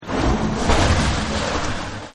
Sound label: Dump It Here, Debris; Materials; Partners In Rhyme